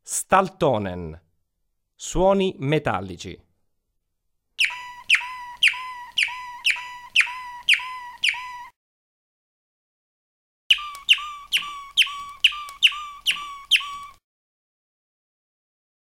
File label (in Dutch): Staaltone, waterslager